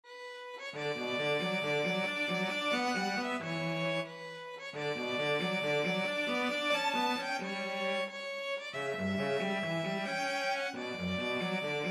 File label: violin-cello duet; brahms sym3 mvt3